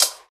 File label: studio hi hat one shot d sharp key, Royality free hi hat tuned to the D# note